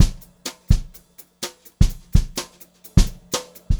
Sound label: Brushed Drum Loops; USB Soundscan vol.39; AKAI